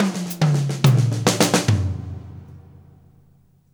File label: Fills 106; Zero-G; Monster Beats